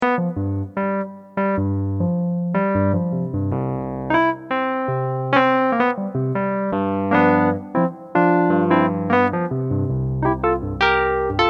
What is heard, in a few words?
Electric Piano